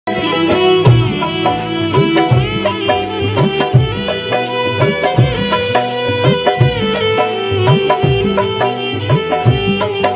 violin cover instrumental bhajan; violin guru

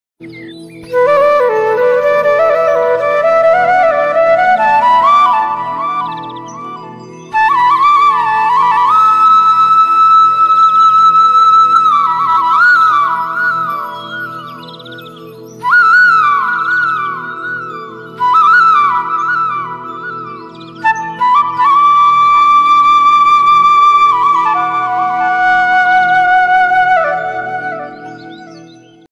theme ringtone flute ringtone; ramayan

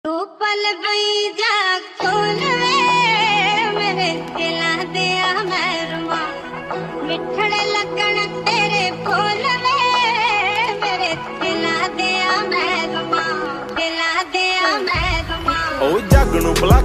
Punjabi songs folk song; expert jatt production

